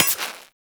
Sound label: Laser Impact Metal; Warframe